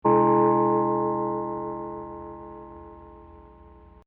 Gong Mysterious; Gongs; Musical

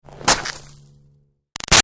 Snow Impact Stab Gritty; Weather; BigRoomSound

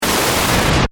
Static Burst Stutter, Static, Burst, Stutter; Production Element, Imaging Element; Accent, Transition; Static Sound Effects; BlastWave

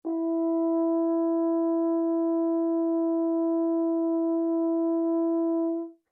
HORN E5 MP LONG, French Horn in F; Alexander French Horn in F; Musical

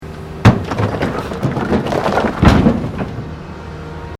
Construction Earthmover Unloading Into Dumptruck; Various construction machinery

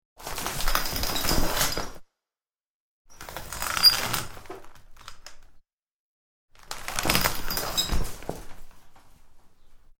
Pulling swish of a plastic shower curtain; Bathroom; Household

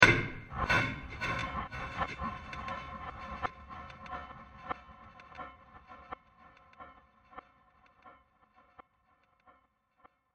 Heavy Mangled Hit With Delays And Scratches; Miscellaneous Impact; CraftedSignals

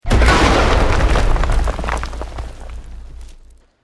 EXPLOSION MEDIUM STONE ROCK

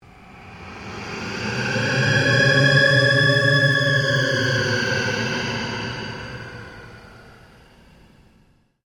PULSE LONG SWEEP

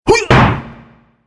Karate Impact Smash, punch and hit

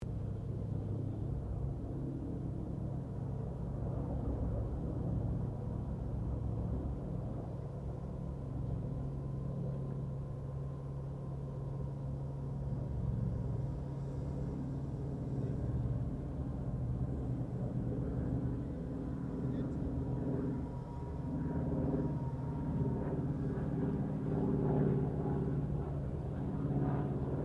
AIRCRAFT AT-6D HARVARD MK III PASS DISTANT; Temora Harvard MK III; Airplanes; Vehicles

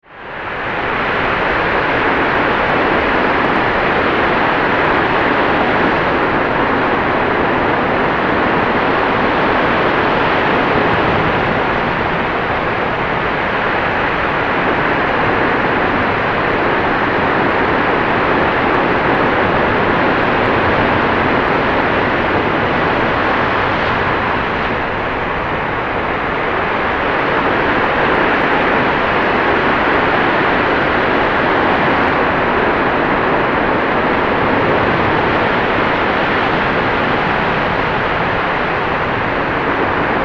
Planet Wind Storm, Science Fiction; Planet, Wind, Storm, Science Fiction, 5.1

